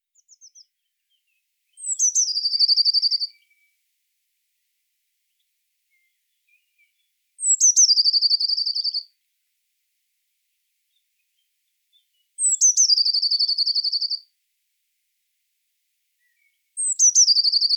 Cyanistes caeruleus; SETE

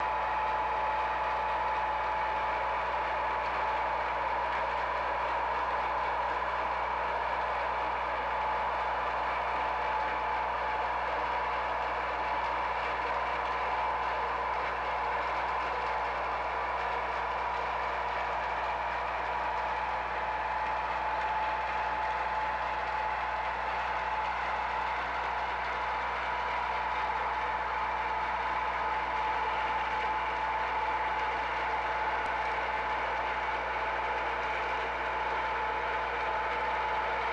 Orca; OrcaLab

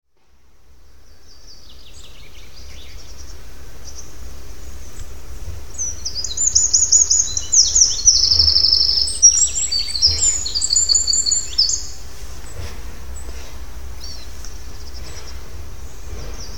Troglodytes troglodytes; SETE

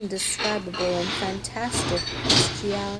noisy

